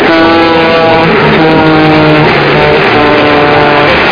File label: Train